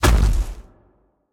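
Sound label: movement footstep; smite; god cthulhu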